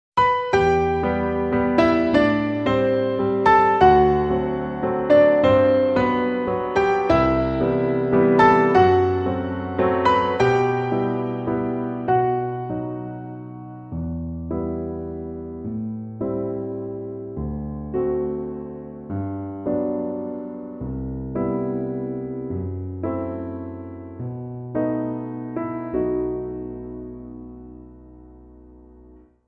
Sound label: Piano Only